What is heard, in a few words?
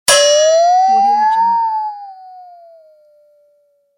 cartoon flexatone bend